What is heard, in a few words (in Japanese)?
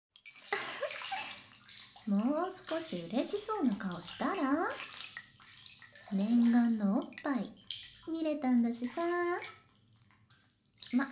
お風呂